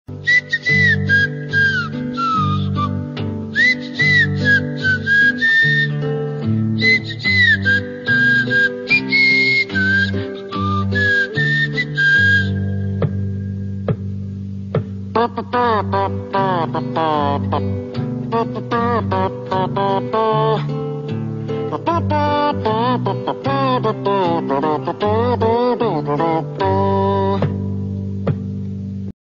Whistle Ringtone

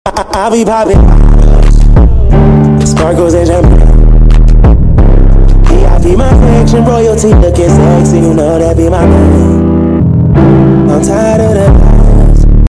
bass boosted Ringtone

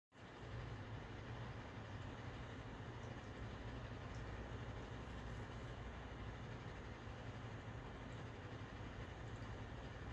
silent ringtone

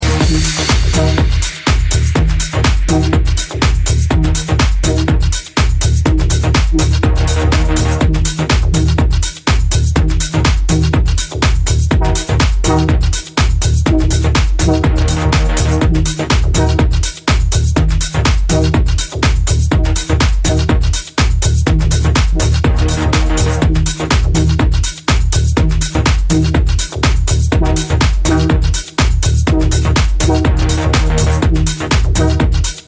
tech house music

